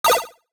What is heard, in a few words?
Item Pick Up; Noisecreations; ARCADIA